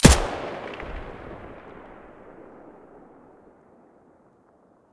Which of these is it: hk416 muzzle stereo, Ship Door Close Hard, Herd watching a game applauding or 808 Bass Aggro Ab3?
hk416 muzzle stereo